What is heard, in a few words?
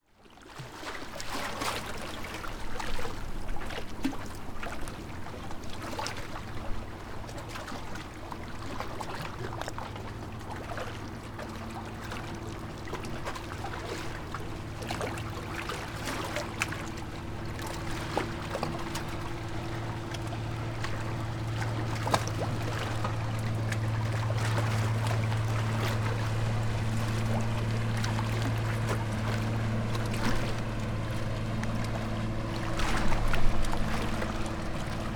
transport boat small motor pass into harbour waves rocks wake